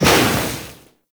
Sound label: Burst; Warframe; Purgatory Warrior